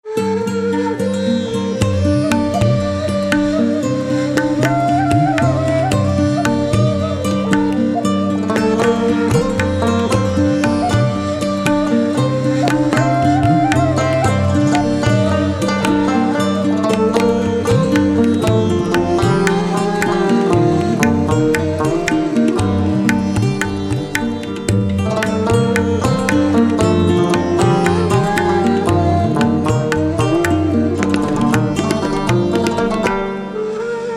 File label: Audiobook Theme; Words in the Dust